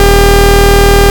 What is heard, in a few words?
bzz; turtlegame; spielemarmelade